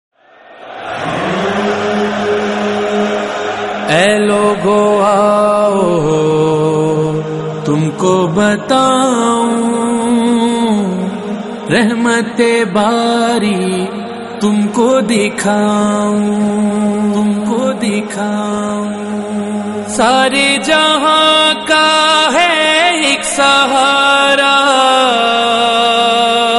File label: Nohay, Noha; Hazrat Abbas; Muharram 1444; Ghazi Abbas Alamdar